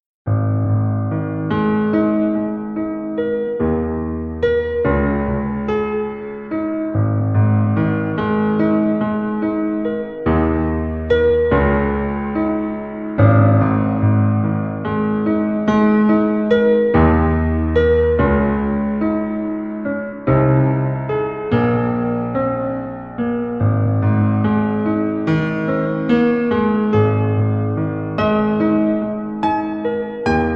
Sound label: vocally 432 hz